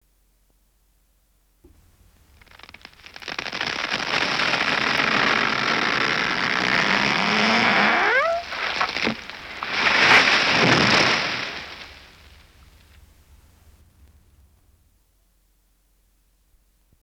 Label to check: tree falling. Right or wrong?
Right